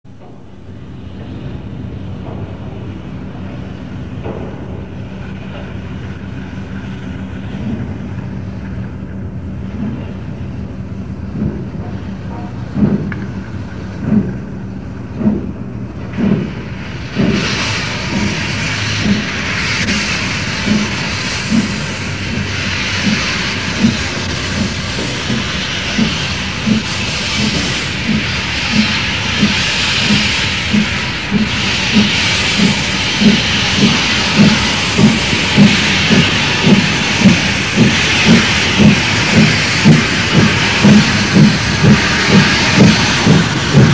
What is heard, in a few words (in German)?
Zischen Pfeifen; alsdorf